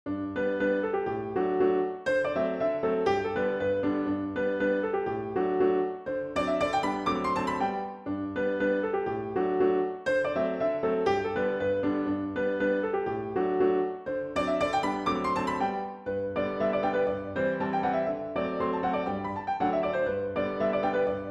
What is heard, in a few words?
polka piano; strong catodon